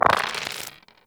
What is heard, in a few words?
Dice Roll Five; Warframe; Koumei